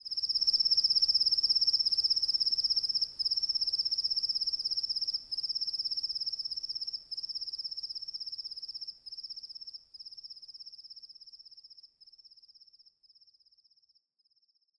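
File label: Ahaggar Crickets left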